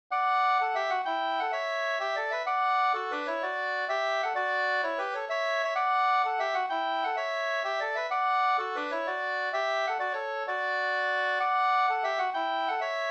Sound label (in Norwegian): pols Rorospols; e Krestaffer Troen no 2st